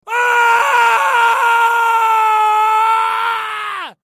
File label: scream; dwight fairfield; long version